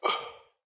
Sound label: breath, creature